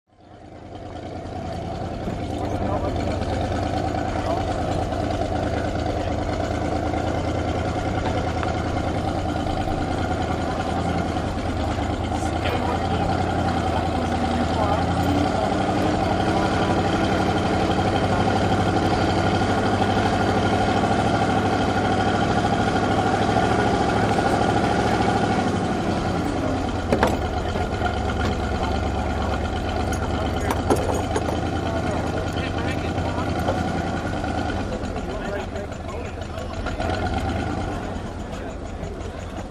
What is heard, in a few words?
Boat Race Pit Doc Amb, motorboats